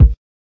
kick

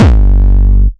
kick blown speaker square; Kicks; single hits

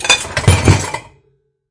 Dish Washer Tray; ModeAudio Found Percussion Hits

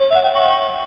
Electro FX; Ueb HE Electro FX

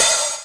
80s HH OPEN, 80s Drum Machine; DRUM MACHINES

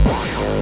obnoxious kick

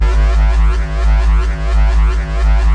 desecrated bass hit, Evil Bass Sounds; Desecrated Productions

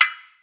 ethnic, Roland TD 7; DRUM MACHINES

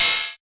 crunchy kit cym; STYLE KITS (Hip Hop, Etc)

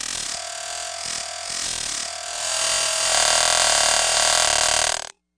stretching cricketoid, looping ambience

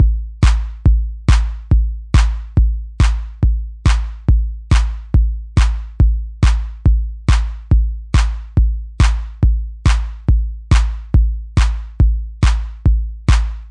140 SubBeats; 140 Telephony

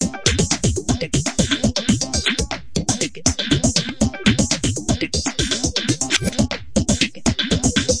120 bpm voxy, drum loops and breaks

